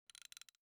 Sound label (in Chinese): 拖动放置; 八音盒